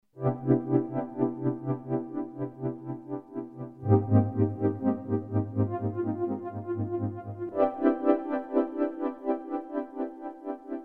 pad chillout, yamaha sy55

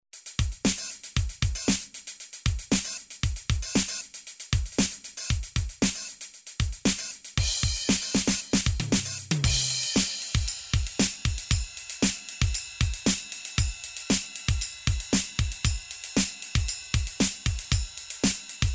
drum, Yamaha TG-500

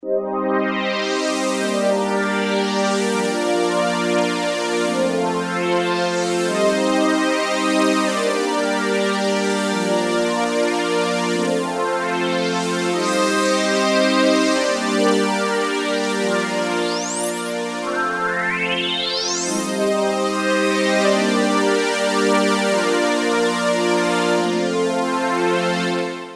pad soft, Creamware minimax

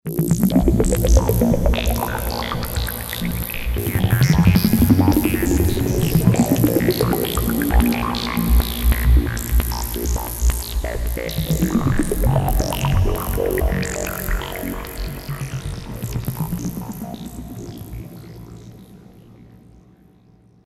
PAD wind pad, Roland JD800; synth

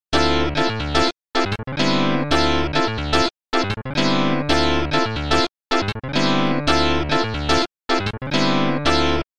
clavinet; emu mo phatt

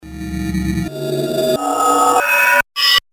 amiga mechanics; commodore amiga

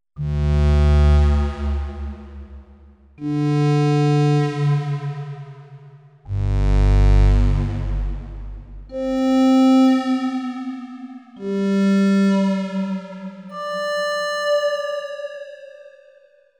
korg m1r synth

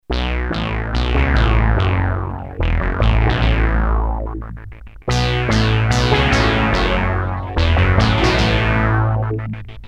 Bass with LFO; Red Sound Darkstar

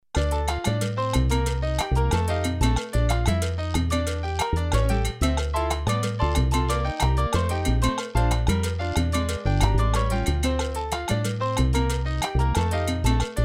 internal beats; emu carnaval; internal beats 1; Motorola 68000 CPU (mc68hc00fn10) system handles up to 32 voices polyphony

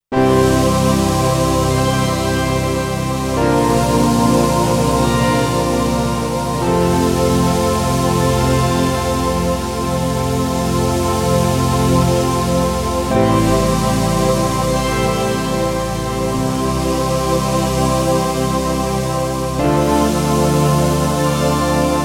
pad happy, yamaha sy99 synth

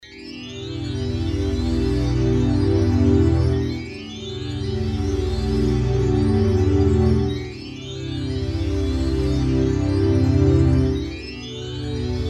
pad, korg n1r

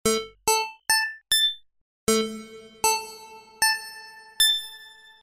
xp reverb, roland jv880

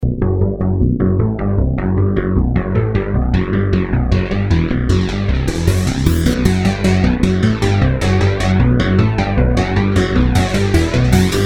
synth melodic, Roland alpha juno

